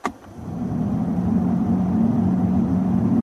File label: Turn On Air Conditioning